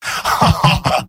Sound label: m laugh evil; tf2; garrysmod; mvm